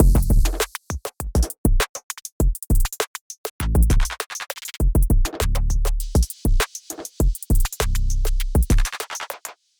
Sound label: Drum machine Enhancer